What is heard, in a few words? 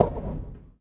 One Shot, Hits; Touch Loops; ElementsOne; Abstract Rhythms